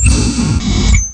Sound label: Printer